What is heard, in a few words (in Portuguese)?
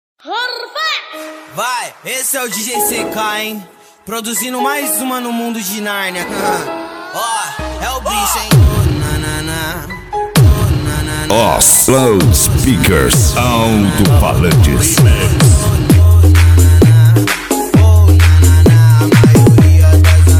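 alto falantes loudspeakers 5250 w agressive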